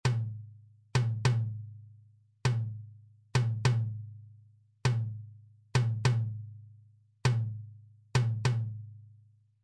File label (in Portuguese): Toque - Surdo Medio